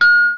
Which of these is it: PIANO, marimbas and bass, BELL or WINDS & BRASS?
BELL